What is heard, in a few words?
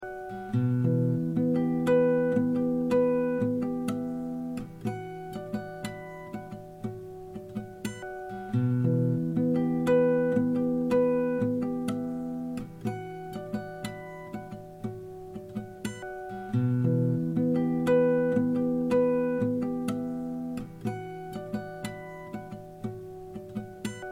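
Em 120 guitar harmonics impro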